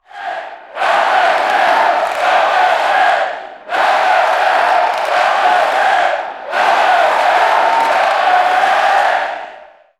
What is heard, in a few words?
celebration